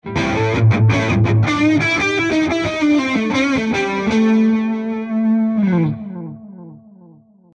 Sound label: Overdrive